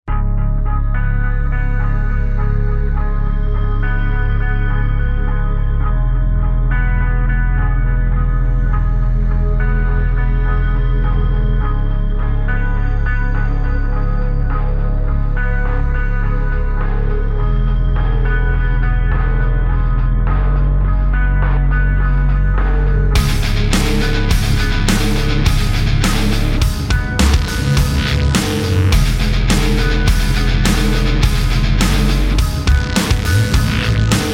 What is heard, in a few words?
music; ui startup